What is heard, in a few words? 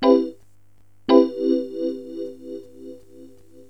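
major 7th, synth chime; cubase; CUBASIS; KEYBOARD; VIBES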